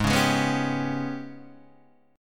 G sharp Minor Major 7th Double Flat 5th, Guitar DADGAD